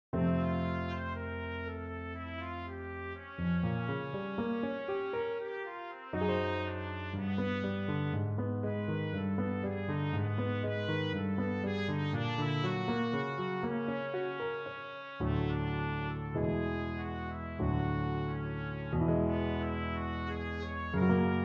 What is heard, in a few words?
trumpet piano